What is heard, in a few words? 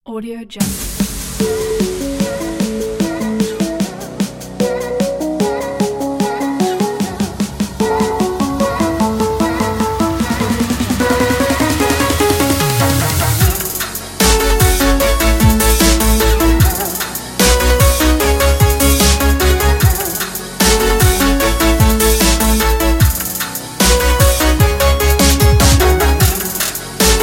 the future bass